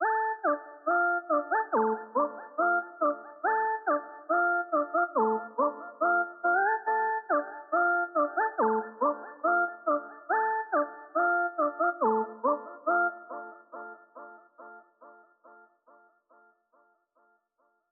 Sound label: mel, BPM140, key D